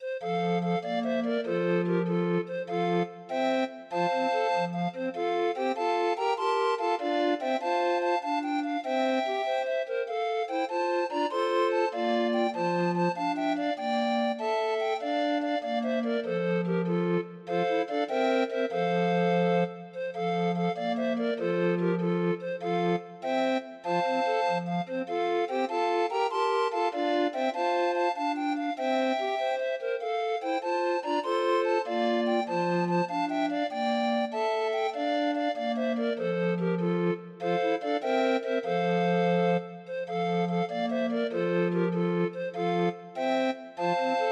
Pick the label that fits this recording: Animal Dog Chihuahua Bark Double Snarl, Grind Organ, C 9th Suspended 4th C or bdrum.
Grind Organ